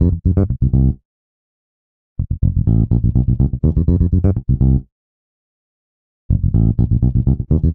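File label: Bass Funk C major 124bpm; Guitar and Bass; Ableton; Factory Packs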